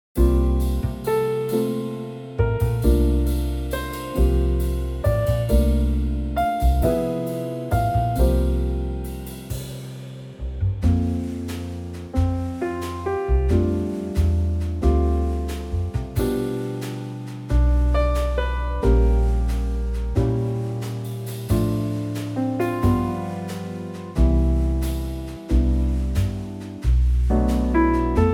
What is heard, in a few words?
trio